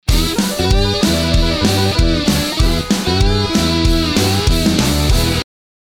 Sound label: Electric Swamp Sting